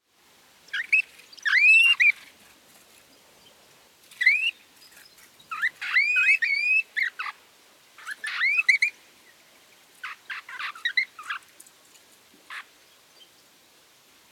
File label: Hooded Robin Melanodryas cucullata cucullata